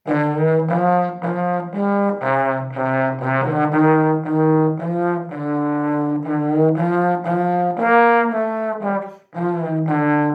Schneefloeckchen Weissroeckchen Posaune

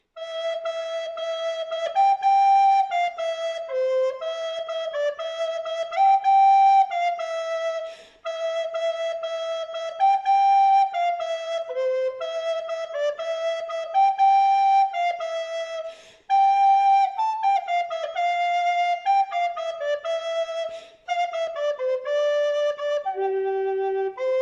Engel haben Himmelslieder Floete

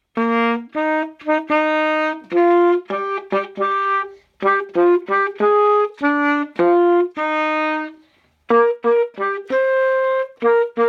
Sax; O Tannenbaum

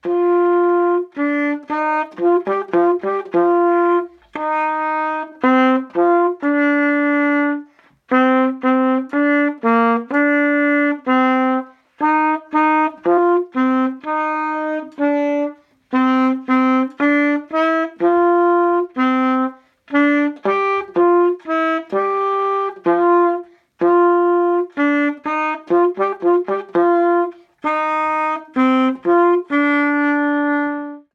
Sax; Kling Gloeckchen klingeling

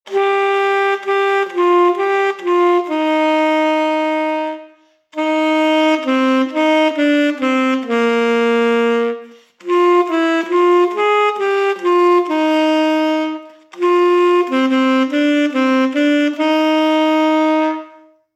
Saxophon; Leise rieselt der Schnee

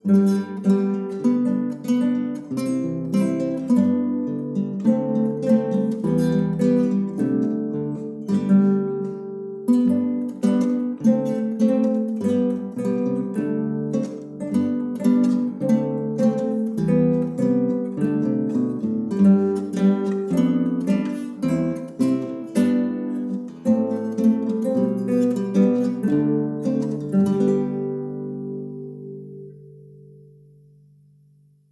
Morgen kommt Der Weihnachtsmann Gitarrengruppe

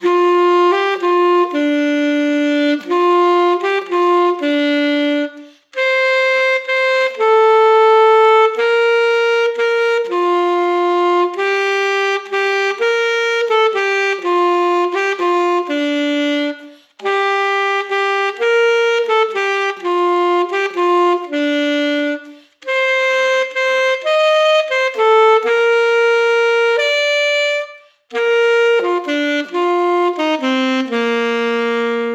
Saxophon; Stille Nacht

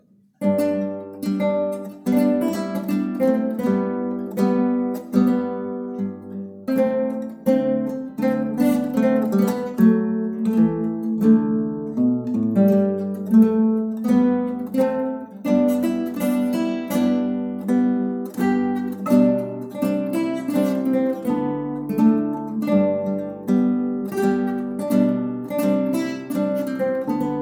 Gitarre; Lasst uns froh und munter

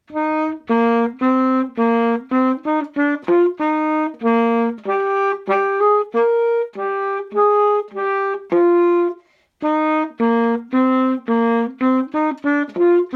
Morgen Kinder wirds was geben, Sax

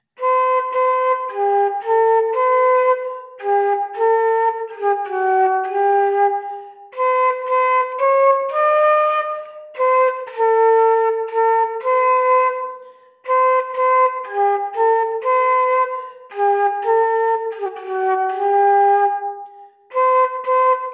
Querfloete; Wir sagen euch an

